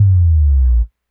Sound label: bass, Hardcore Breakbeat, 151, Ebmin; Big Fish Audio; Hardcore Breakbeat Action; Rhythms 4 Life 151 Ebmin